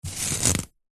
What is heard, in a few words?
office string pull tight; zapsplat